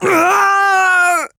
falling; Paladins; Koga

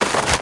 Treant footstep; Nature's Prophet

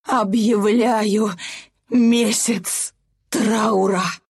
pain death; dota2

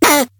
pain; Awesomenauts

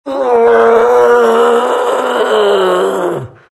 moans; undying

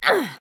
Grunt Attack; Furia; Paladins

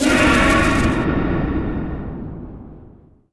Fluttering Crypt Swarm; Fluttering Mortis

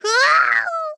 Falling; paladins; Rider

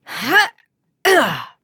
Grunt Jump; paladins; Seris

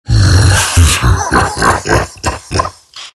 morph laugh; Terrorblade

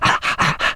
happy; Frost Avalanche Pup

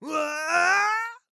Falling; Paladins; Demon

